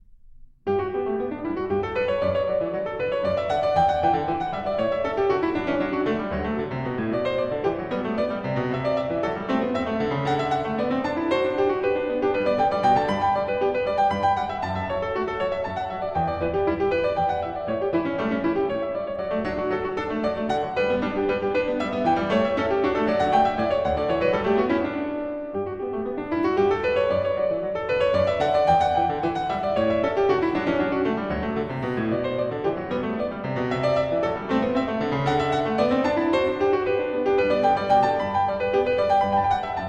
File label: Bach Goldberg Variations BWV988 Variation 1 - Allegro Moderato